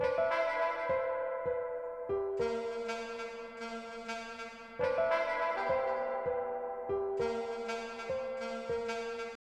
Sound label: Piano Sax Combo, 100 Cmin